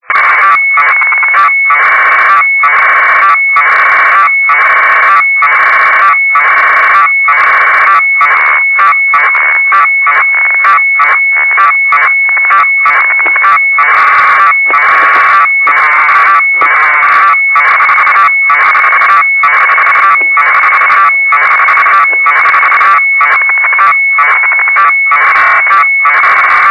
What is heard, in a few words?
UNITEC-1 fsk tx test on ground